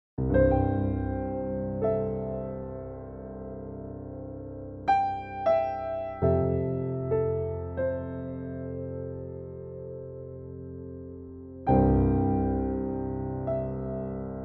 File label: Pensive Piano